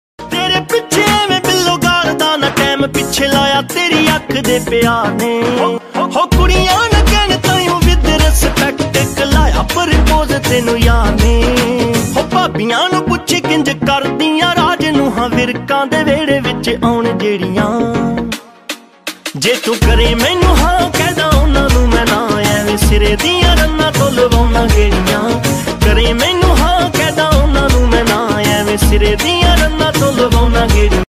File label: Desi Punjabi Ringtone